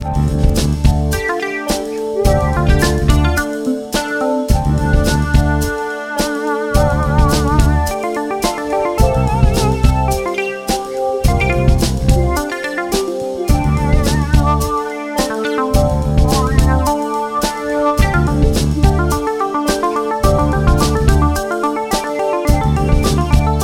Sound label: Lead; DeepMind 12